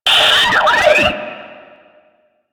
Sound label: Miltary Backpack Shutdown; Objects; DE; Warframe; Storage Container